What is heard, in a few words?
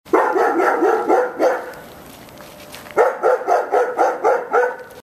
territorial barking